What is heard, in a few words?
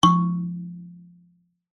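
musical kalimba tine pluck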